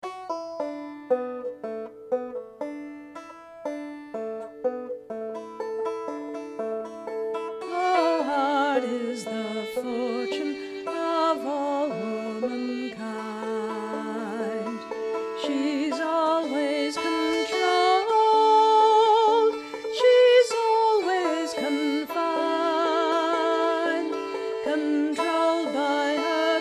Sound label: fiddle; banjo; Wagoner's Lad/ Elzic's Farewell